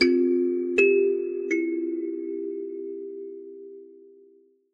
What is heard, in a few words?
kalimba